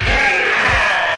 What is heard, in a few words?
"hoo ahh" filtered, voice; ooh ahh; ho hoo woo